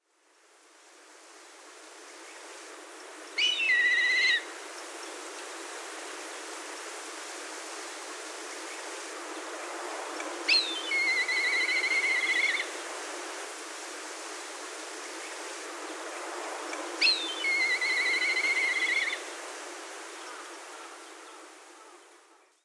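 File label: black kite, sado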